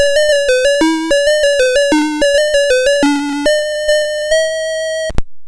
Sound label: BASIC, MOS6581R3